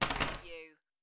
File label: Poker Chips Drop Two Chips On Wood Table